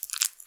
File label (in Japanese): 膣外射精